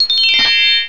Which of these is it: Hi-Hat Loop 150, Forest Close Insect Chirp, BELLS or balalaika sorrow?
BELLS